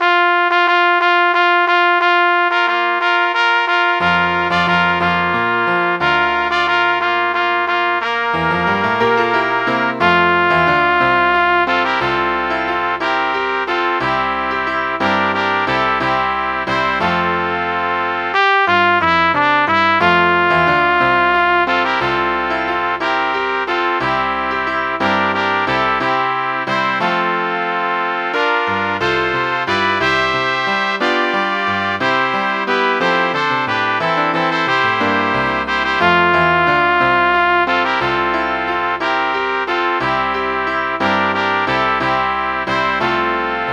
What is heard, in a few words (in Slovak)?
pod oknom sv. huberta - pre 3 trúbky a klavír